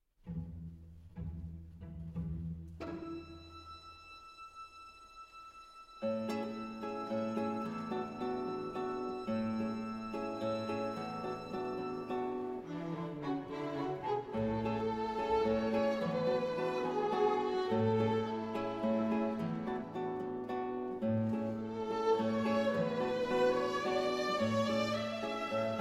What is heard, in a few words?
in tempo moderato